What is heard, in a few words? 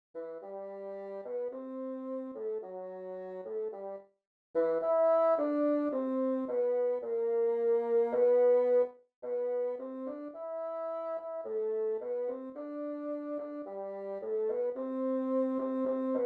bassoon; UVM Band